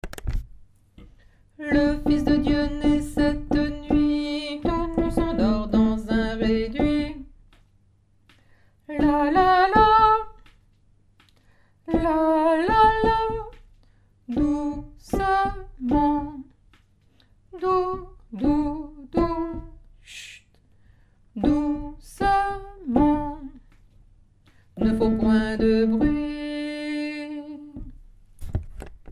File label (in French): l'enfant dort basse; nouveaux noels; chants noel